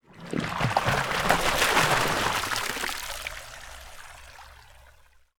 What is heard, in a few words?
Water; TOOLKIT vol.2